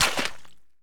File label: mud step; Golden Crayon Sounds